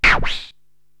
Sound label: DJ Rub Reverse